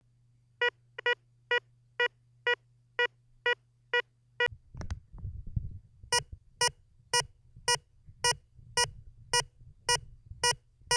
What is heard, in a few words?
tejon sounds